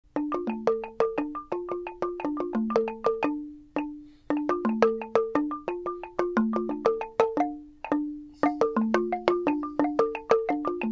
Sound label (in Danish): 3 klang